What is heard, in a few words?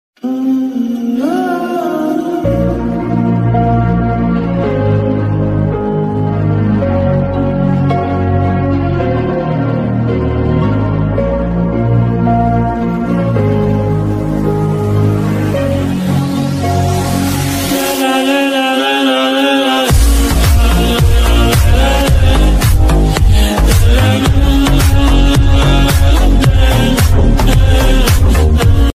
alone ringtone bgm ringtone background song lofi song ringtone; aadu edits